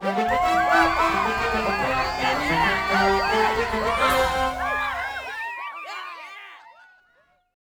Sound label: music race win; TyTheTasmanianTiger3; XBOX